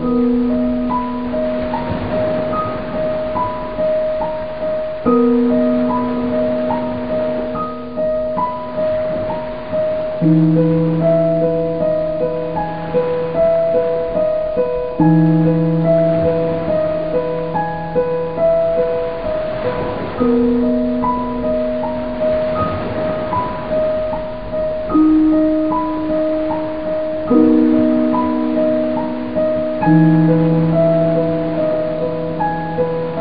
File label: Piano waves